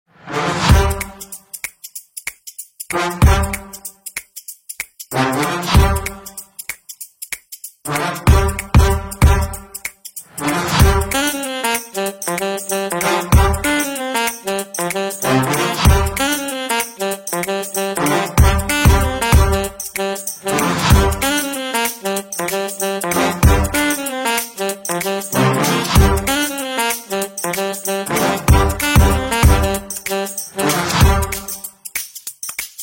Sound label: HAPPY and FUN Background Music for Videos